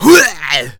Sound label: attack, Zombies; zps; Z Punk